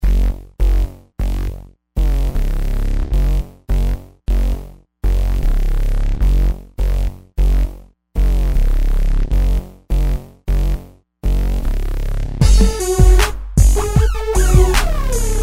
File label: dirty south